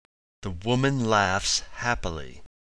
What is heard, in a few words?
laugh